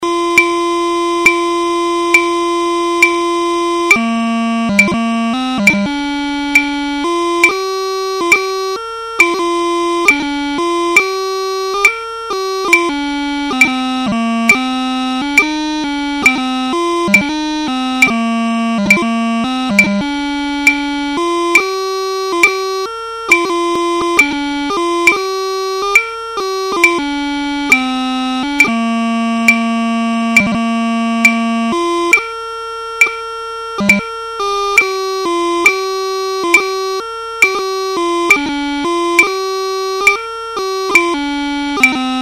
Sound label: Wings - 68 bpm, pipe band; highlanders; piping tutorial materials